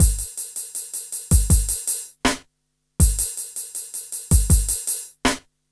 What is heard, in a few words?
Smooth, R&B Drums